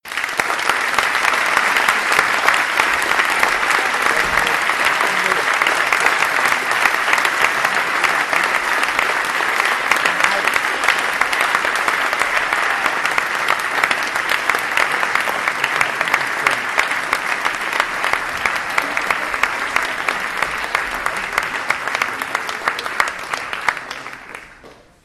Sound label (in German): Publikum antwortet Orchester mit Applaus; Tanz der Ritter; Sergei Prokofjew; Konzertmitschnitt vom 2. Juli 2024